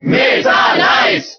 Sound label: Cheer German; Meta Knight; SSBB